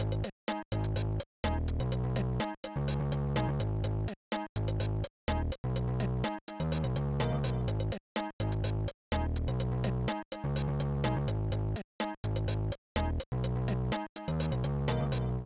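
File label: keygen song.xm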